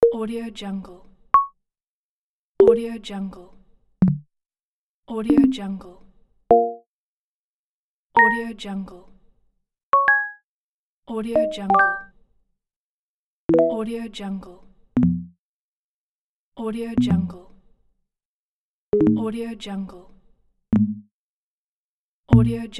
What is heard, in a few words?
ui flat minimal interface sounds; vlad korolkov